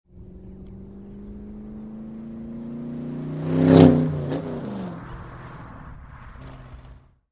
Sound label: Car Sounds 67 gt 500 shelby mustang